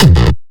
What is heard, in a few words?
bass kick